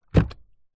industrial engine oil large tub set down; zapsplat

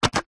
leisure toy plastic gun trigger pull

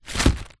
glass bottle in paper bag set down on table; foley; zapsplat

